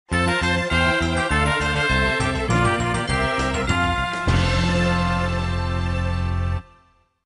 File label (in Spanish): Tema de victoria; Donkey Kong; SSB